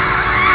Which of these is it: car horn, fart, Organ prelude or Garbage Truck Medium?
car horn